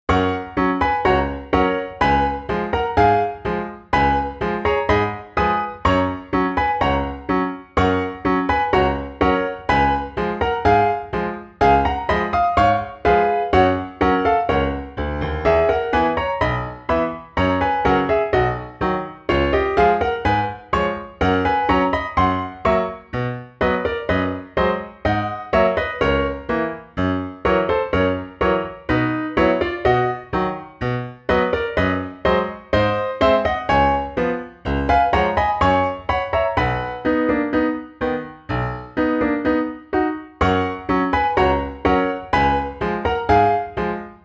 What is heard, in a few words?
piano; koinu